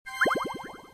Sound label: close